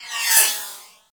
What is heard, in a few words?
Swarm Cannon Projectile; Warframe; Weapons; Corpus